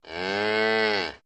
Cow Moo

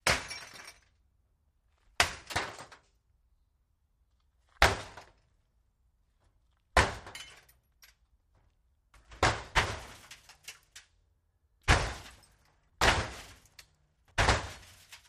Car Smash Hit Destroy

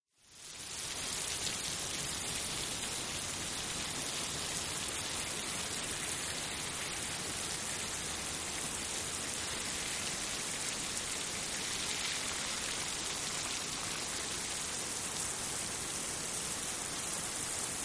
Rain Fall On Concrete

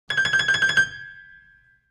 Piano High Trill, keyboards piano

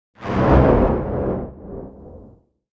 Thunder Whoosh, whooshes

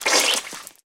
Bone Breaks Blood; punches kicks hits bones

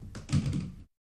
Billiards Ball Falli

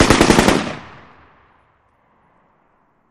Machine Gun Sngl Burst; bursts

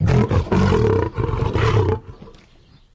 Lion Roar Med 3 Pulses; big cats

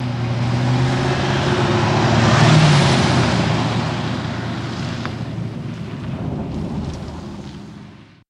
Cadillac Accelerate

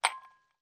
Ice Cube Glass

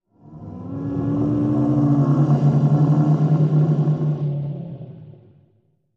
Animal Stinger Bull; stingers & whooshes

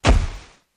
Camera flash, flash pot, good poof; cameras

